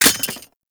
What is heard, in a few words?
Uzi Mag Drop; Warframe; Tenno; Prime Tenno Uzi; Weapons